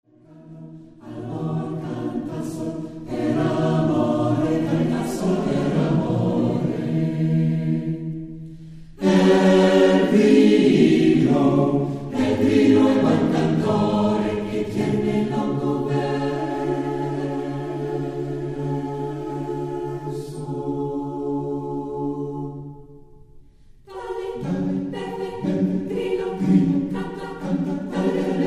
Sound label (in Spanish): El grillo